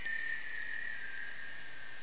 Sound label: ethereal end; Giza 2.0